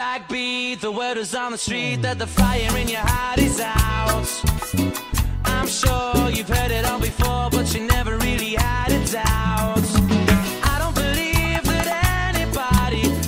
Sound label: Cumbia